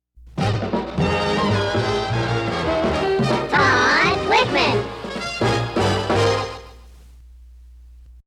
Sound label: banana jingle